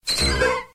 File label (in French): Cri; Cri de Ptitard dans {{Jeu XY}}.